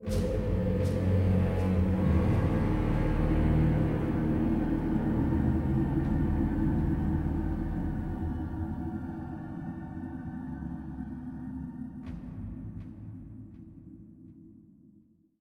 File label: Music Shorts